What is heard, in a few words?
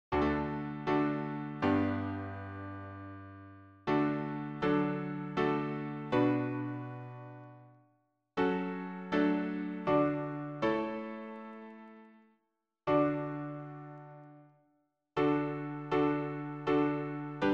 harmony piano